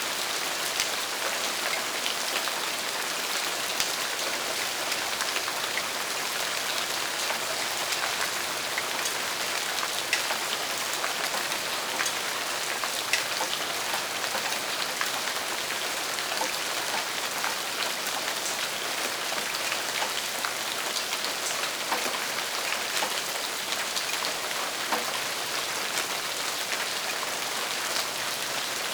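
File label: rain medium close drips; WAR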